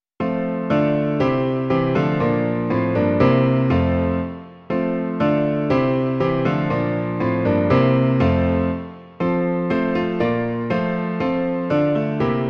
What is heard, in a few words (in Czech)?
Vstal z mrtvých Kristus Spasitel - klavír; Klavírní verze